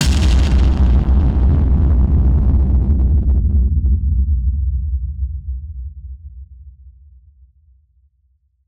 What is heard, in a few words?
Drum Bomb; musicradar; bomb factory; Drum Bombs